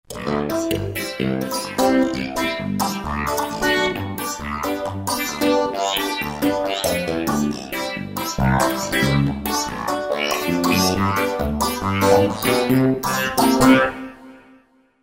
Picker, Roland V-Synth; Factory Patches; 235 Piggy Picker

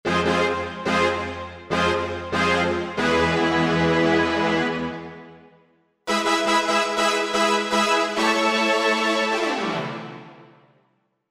Brass string, Korg MSC-1S; Brasstring; Korg MSC-1S Variety

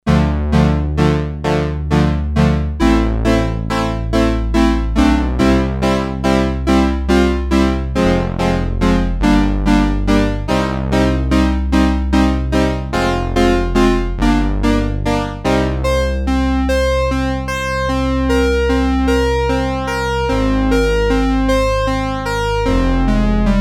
Full synth, Sequential Circuits Six-Trak; Factory Programs

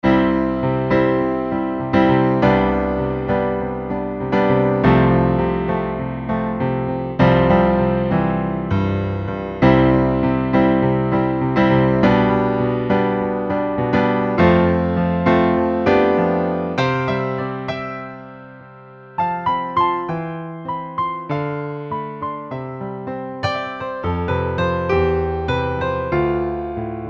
Dynamic Grand

